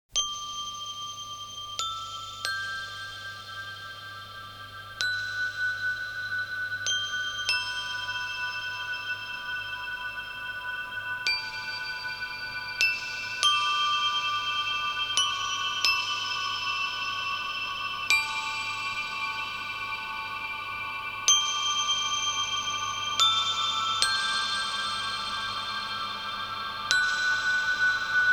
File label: chorale